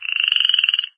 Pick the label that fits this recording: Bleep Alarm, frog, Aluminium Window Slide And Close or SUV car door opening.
frog